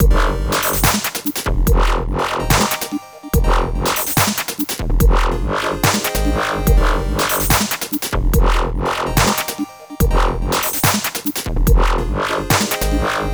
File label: Dubstep Complex Rhythm